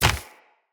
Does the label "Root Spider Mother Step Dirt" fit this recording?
Yes